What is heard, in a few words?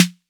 Snare 808 Tone1; gate experiments